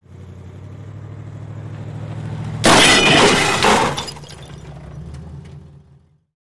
Drop Impact Moving Vehicle Collides With Stationary Vehicle, R To L, Great Breaking Glass Sounds